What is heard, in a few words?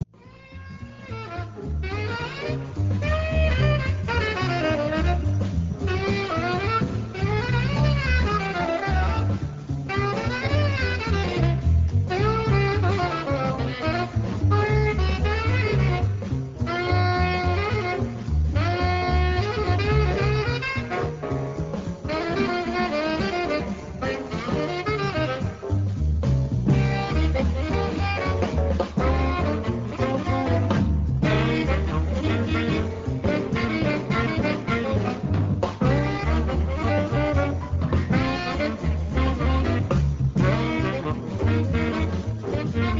improvisation